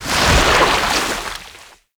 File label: Aura Ink Explosion; Gameplay; Warframe; Shadowgrapher